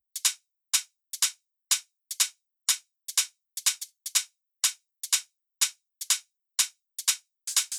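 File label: 123 hi hat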